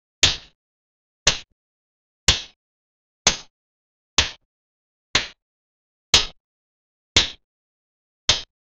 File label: beating with a stick soun; weaponry